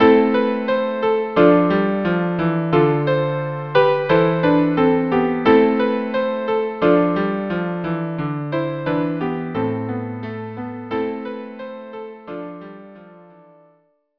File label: violin piano